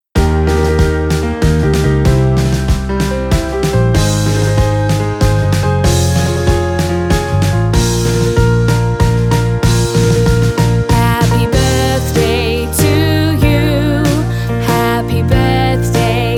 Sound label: Happy Birthday song